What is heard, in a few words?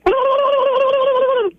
gobble